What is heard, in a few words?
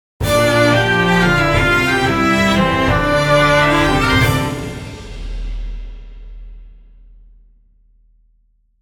sound logo orchestral; gaziantep city